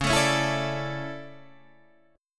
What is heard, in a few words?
D Major 7th Suspended 2nd